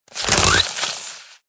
Origami Frog Play

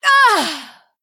Female Human Death

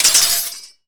Swords Death Underlay

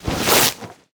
Stuffed Sack Death Underlay

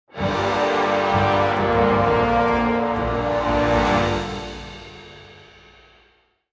Stinger; Aman Thul

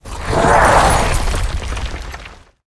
Ice Rager, Play

